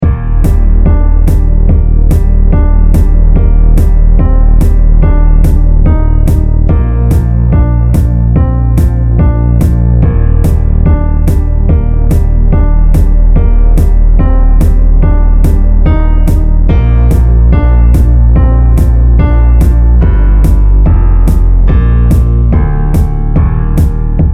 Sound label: bass chorus